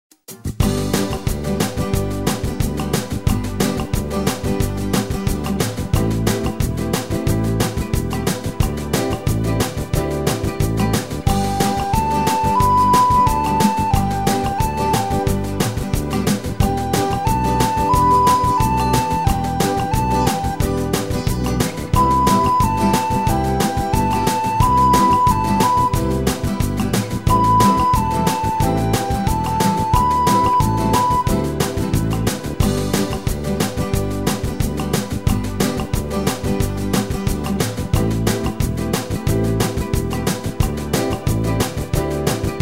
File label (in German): blockflötentaxi